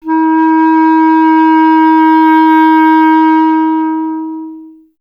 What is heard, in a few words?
clarinet D#